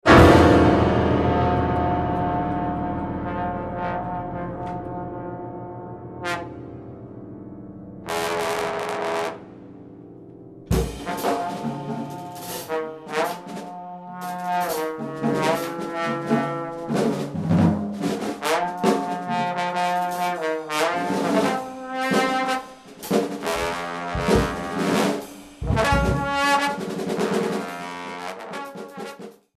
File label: Duet